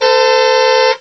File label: Honk; 暴力赛车